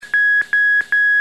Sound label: Beeps; Mastermind